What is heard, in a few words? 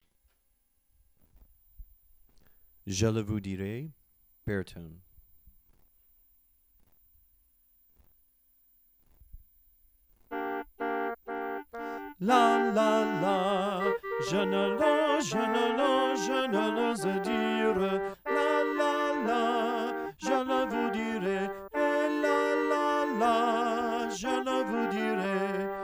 baritone